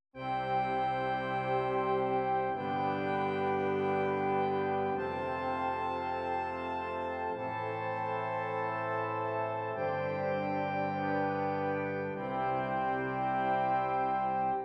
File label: Brass interlocked harmony